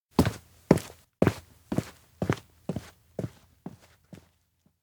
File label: steps outdoor town push walk; chiharu; anemoi